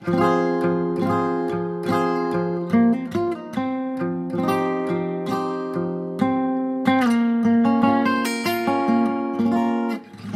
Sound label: music gtr